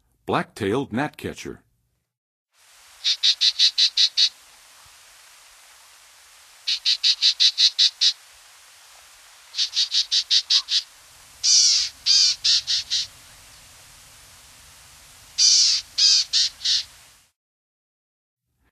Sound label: Black-tailed Gnatcatcher, Songs of Birds; North America; Western USA; Western USA Songbirds